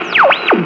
radio tuning; Voice SE; Nintendo; sf64

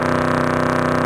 hotrod loop high, vehicles; warthog left

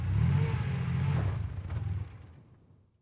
porsche out, vehicles, warthog

